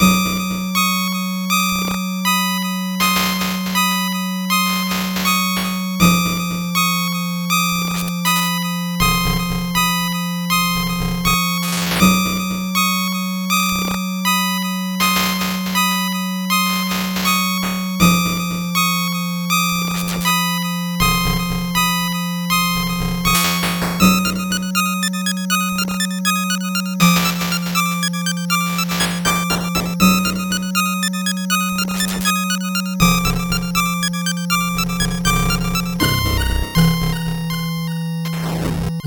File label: 8bit bgm slow